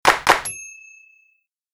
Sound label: double clap